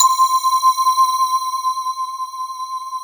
ethno bell C5; FUNNYBELL; Synth

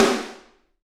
SD Real, Roland; Sound & Vision - Gigapack I

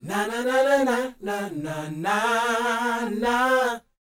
NA-NA A#; Spectrasonics Vocal Planet

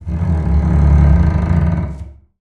TALK BOW, BASS FINGER; Xsample VOL-5

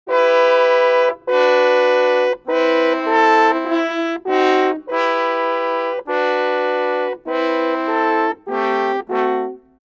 100 Emin hi part, brass; 90 s Sample CDs; PowerFX - Downtown Orchestra

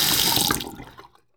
FAUCET OFF, KITCHEN; AKAI S6000 CD-ROM - Volume 6; Daily Life

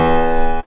P. CHORDS, KEYS

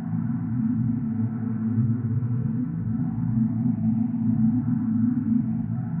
Panned Organ, non tempo loops; stereo toolkit samples

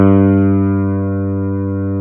CP70

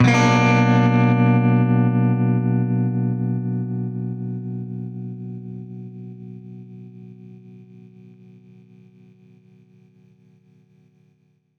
Baritone Chord Bmin[maj7], Guitar Chords; undercover samples

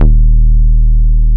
HOUSEY D#1, BASSES; Best Service Alpha Dance vol.2

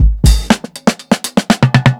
120 FUNKY FILL, DRUMS LOOPS; BASEMENT DRUMS VOL 2

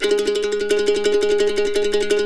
C E trill G#, Blues Guitar

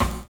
SHUFFLE; Univers Sons

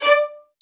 D5, LOUD VIOLINS; Q Up Arts; Denny Jaeger Master Violin Library

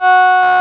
vocoders; Sound & Vision - Gigapack I CD 2

